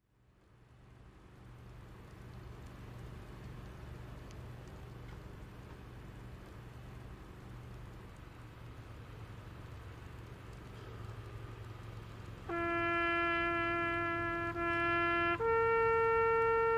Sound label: US Army Bugler Taps